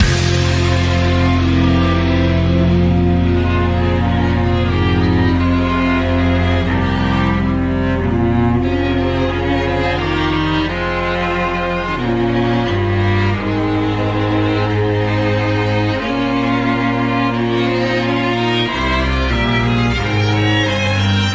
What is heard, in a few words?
guitar, Loops